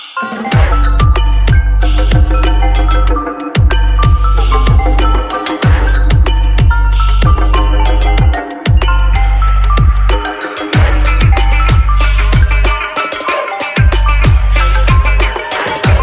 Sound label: Samsung Galaxy M12